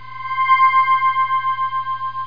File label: Ring; Troubled Souls